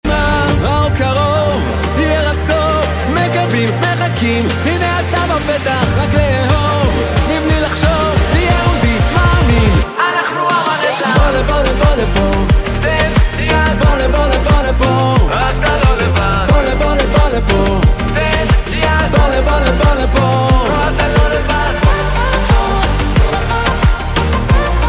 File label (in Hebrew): רינגטון